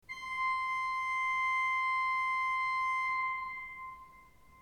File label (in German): ton, orgel